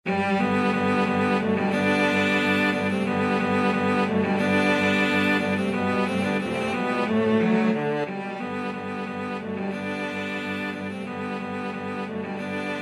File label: vivaldi spring, cello quartet